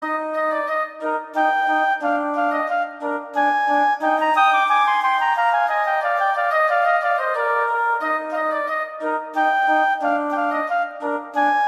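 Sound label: Duet, horn; Mozart; Duets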